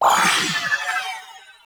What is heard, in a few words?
Score bubble combo end; LittleBigPlanet 2; PS3